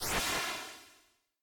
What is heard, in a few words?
Woosh